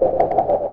Weapons Roll

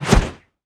Basic Swish M

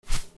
Flap

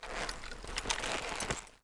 Idle leather mid

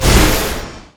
Skill Shot

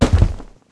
DROP

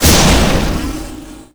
Echo of earth

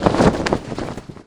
Flag

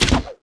ARROW

